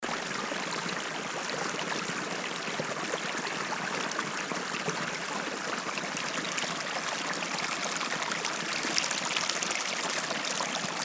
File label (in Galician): Auga da cal